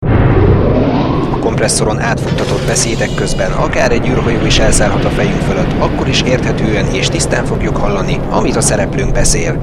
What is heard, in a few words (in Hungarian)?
kompresszor